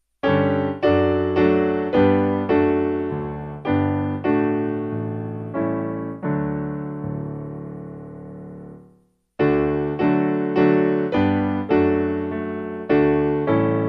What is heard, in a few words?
Piano; Be Still And Know